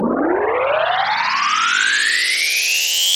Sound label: Tape On